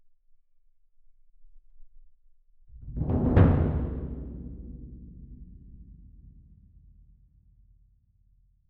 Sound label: Perc